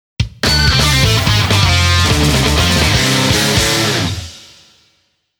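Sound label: Game Over BGM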